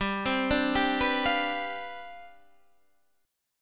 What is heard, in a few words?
C Major 7th Suspended 2nd Suspended 4th, G, chord